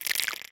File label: slobber, clams; infestation